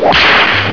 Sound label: whip